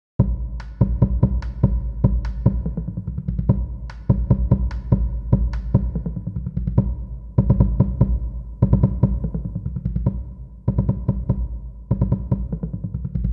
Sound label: jig bass Drums